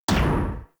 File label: Pulse Laser Mech; Warframe; Railjack; RailJack Weapons